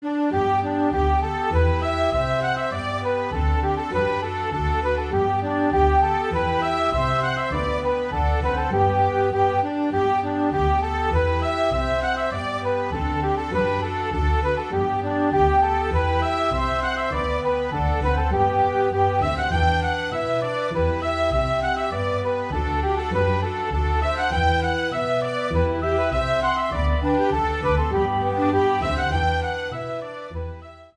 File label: Irish Polkas